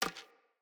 Hunter Bow Grab; Weapons; Player; Bows; EnvoyHunterBowRangedMelee

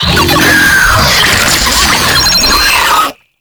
Proto Infested Brachiolyst Idle, Vocals, Enemies; Warframe

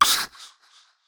Girl Pain I; Warframe; Zariman; Skitter Girl

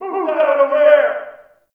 Ambient Campsite Yells; Warframe; Tau

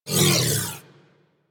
Crewship Turret Proj By, Corpus; Warframe; Rail Jack

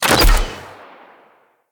Bow Shot Heavy; Sinecure

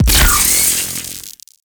Teshin Jolt; Mission Four; Warframe; The New War

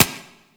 Lasrian APC Turret Low; weapons; Warframe

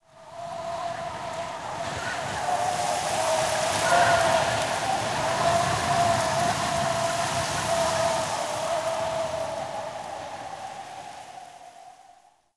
Night Wind Gust Medium; Ambience; Warframe; Eidolon Plains

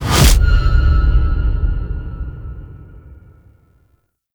Mind Control End; Sensei; Orbital Station

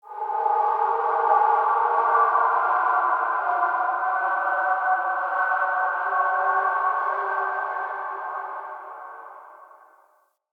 Crypt Spirit Cry; Ambience; Champion Crypt

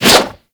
Whoosh, weapons; Warframe; Tenno; Ankyros; Prime Ankyros

